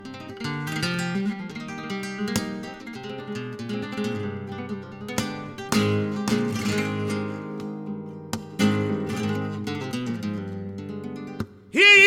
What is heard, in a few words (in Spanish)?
Solea, flamenco; del Machango